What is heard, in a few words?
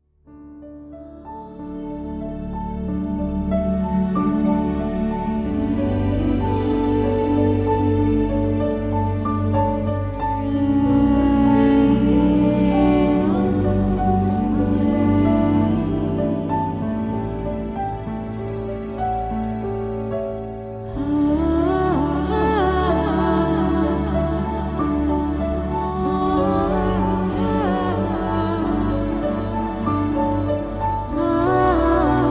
relaxing music